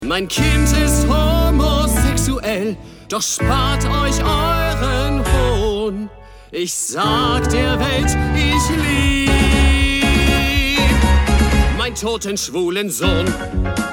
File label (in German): Gesang; Heathers